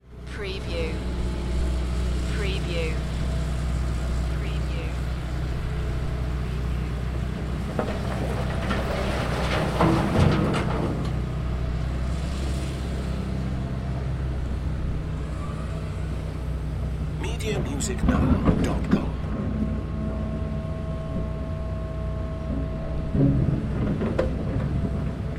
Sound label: Construction - Excavator Working